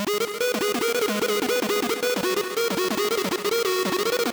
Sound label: sub lead bg